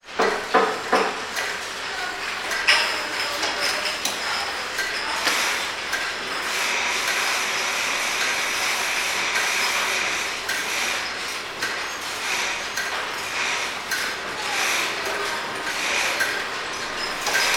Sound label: ambience factory pill packing; sound effects one